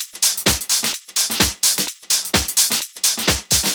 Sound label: Minimal Loops